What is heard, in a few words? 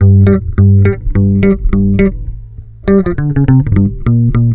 bass, Roots Funk; USB Soundscan vol.41; AKAI